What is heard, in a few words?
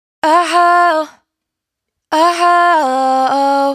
riff Ah Huh 128 Fm, Vocal, Dry Vocals; Raw Vocals; Slate Digital Omnivox Vocal Sample Pack